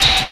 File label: Trap Fx; Sonic Foundry (Sony Creative Software); Esoterik Beatz